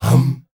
HUM HUM, World Voices, S.AFRICAN; ILIO; Vocal Planet

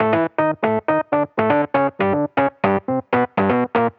jazz keys ElPiano 120 B-line n Riff

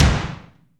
BONUS BD, Drums

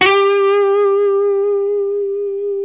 LES PAUL LD G 4; Spectrasonics; Hans Zimmer Guitars VOL-1

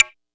kit, Yamaha Motif-ES8

